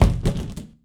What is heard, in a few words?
Plastic, Collision Plastic; SIMUZENSOUND; TURISMO